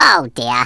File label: fall; worm